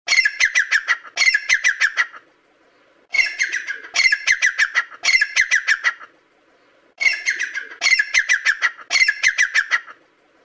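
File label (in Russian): francolin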